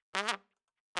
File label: Trump